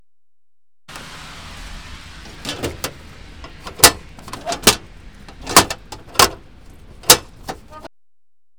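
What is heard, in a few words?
open mailbox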